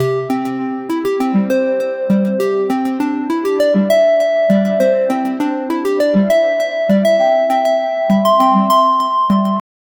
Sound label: c major 100 bpm ascending, ascending melody